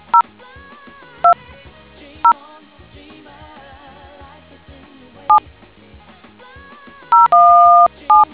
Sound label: dtmf